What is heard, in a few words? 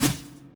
Jump; FMOD Project; CaiStuff; Player